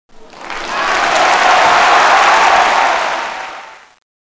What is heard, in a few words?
crowd, Act1SndT; SoftKey